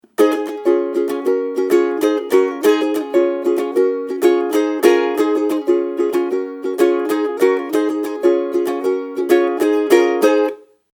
ukulele

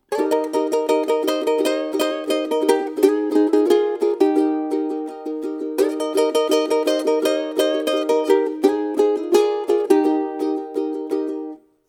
Solo, ukulele

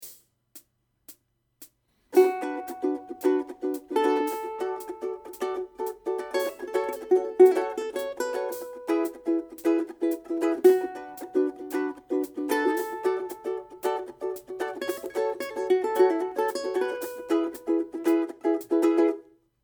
Outro Solo, ukulele

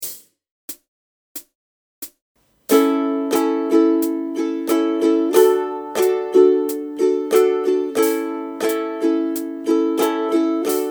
Strum, ukulele

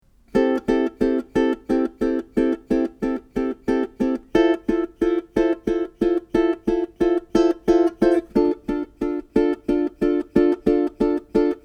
Strings, ukulele

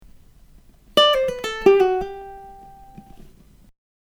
Lick, ukulele

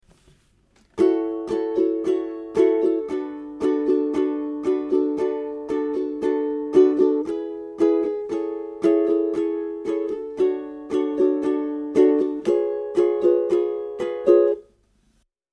Verse Strum, ukulele

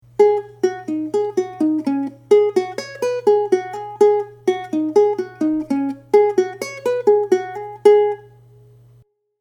Groove, ukulele

